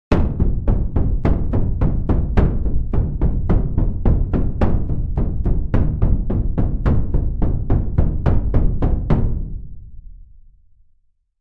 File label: war drums